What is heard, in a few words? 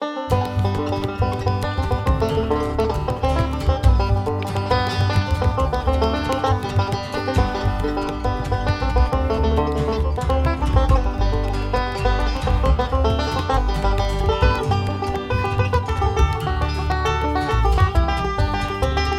irish wash, banjo